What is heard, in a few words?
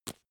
magazine tap